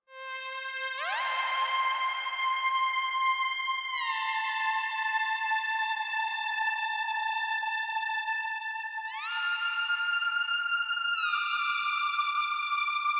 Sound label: Lead Synth; Martian Chess Audio